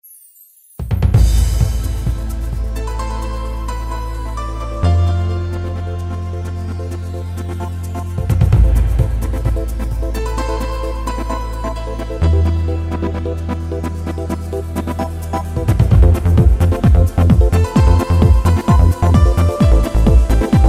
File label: Ibiza, Yamaha PSR-3000 Factory Styles Dance